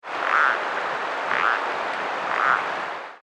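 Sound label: rboylii air short, frogs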